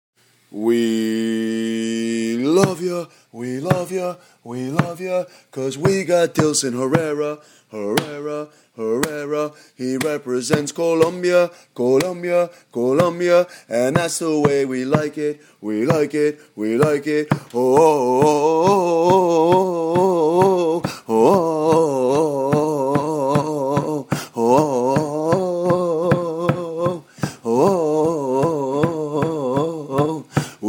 chant

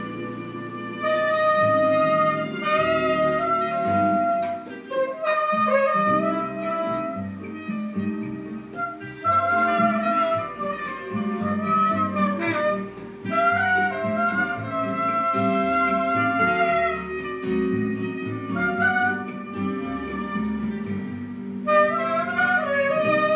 sax; uci

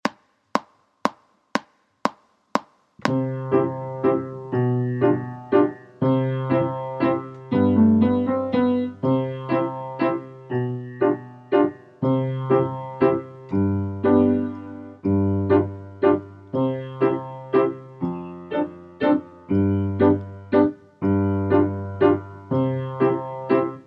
minuet for mock trumpet piano only

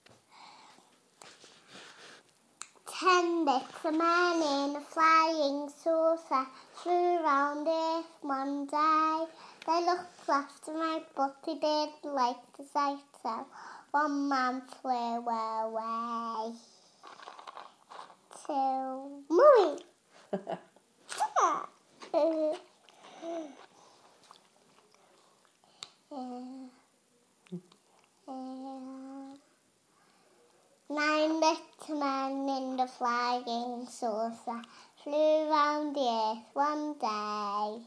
small girl sings 10 little men

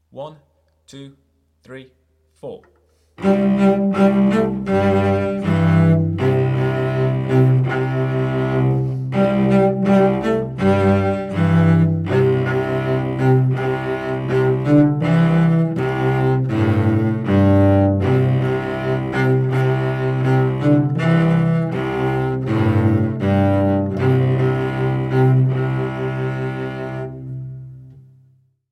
cello; hill an gully rider

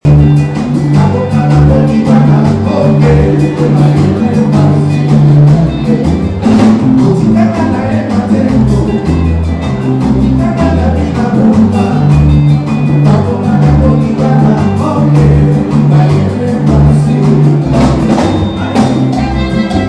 Congolese afro cuban cha cha jazz

